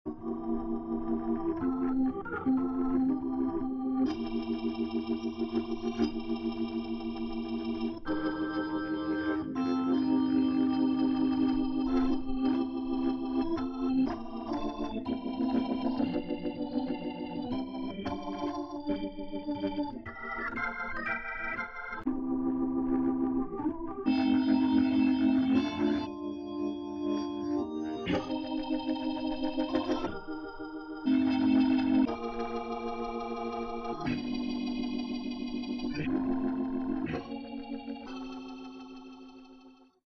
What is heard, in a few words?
solo